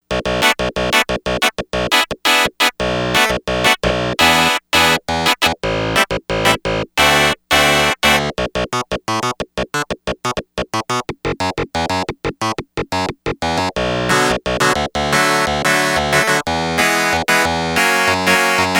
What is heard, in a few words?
Fuzz Clav, Yamaha DX100; Factory Patches